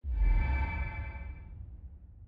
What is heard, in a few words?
hover